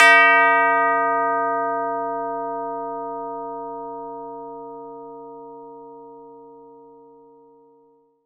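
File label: CHIME C4, Synclavier Percussion; ILIO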